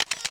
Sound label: Click Sequence, camera; SV730; LG